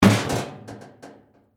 Trash Can Set Down, Household, Trash, Can, Set, Down, Metal, Garbage, Can